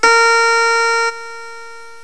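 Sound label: HARPSICHORD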